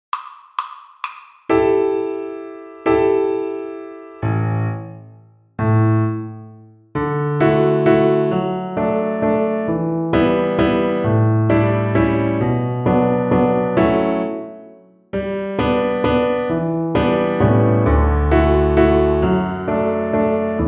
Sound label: String Gym, Piano Accompaniments